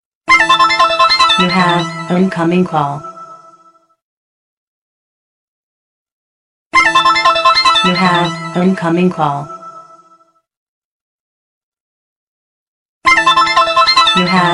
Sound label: Incoming Call